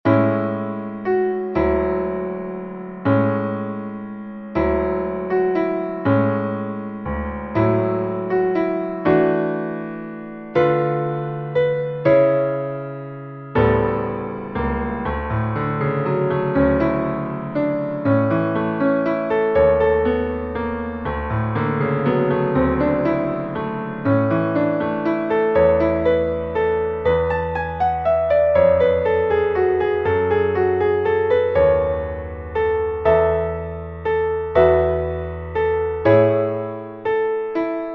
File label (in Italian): violoncello Piano; astro del ciel; Silent Night